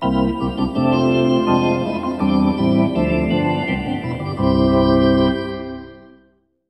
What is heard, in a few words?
Hockey Organ